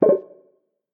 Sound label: Put Down Piece; Martian Chess Audio